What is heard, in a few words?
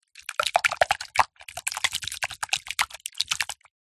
cartoon slime gloop slop movement